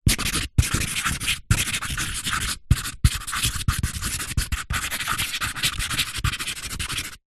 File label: office marker pen highlighter luminous writing fast on cardboard